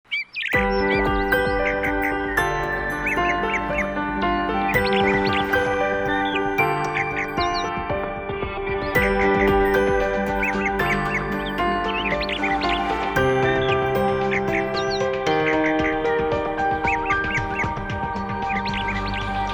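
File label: Bird s Twitter, alarms; ZTE Nubia V70 Max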